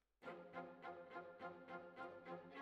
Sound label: Violas Over